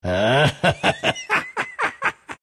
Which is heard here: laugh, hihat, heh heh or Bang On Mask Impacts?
laugh